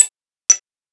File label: tick tock